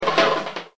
BUCKET

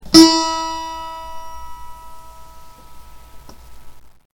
box

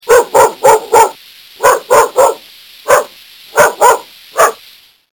dog

